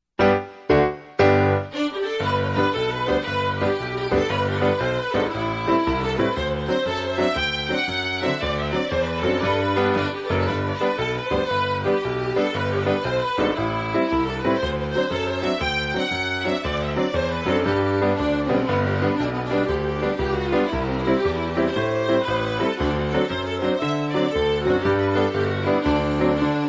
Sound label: Jig Medley